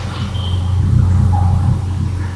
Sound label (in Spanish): sonido de maquina; terror en el 3b